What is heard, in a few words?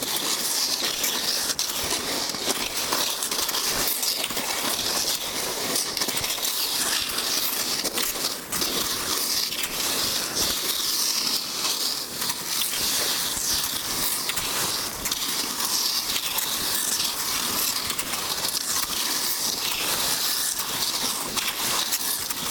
Cross Country Skiing